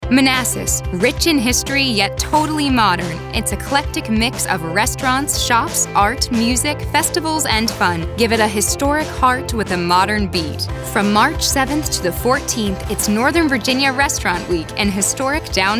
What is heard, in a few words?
Branding Spot Music; Manassas